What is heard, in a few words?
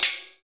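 PER PROCU, Procussion, percussion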